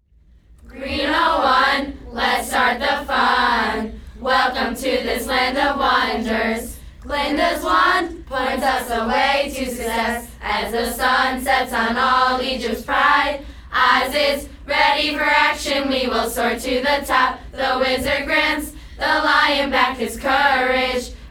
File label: cheers